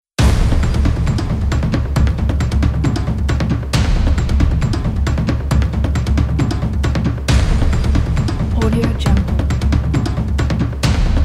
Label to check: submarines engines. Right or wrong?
Wrong